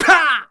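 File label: Mon Man ATTACK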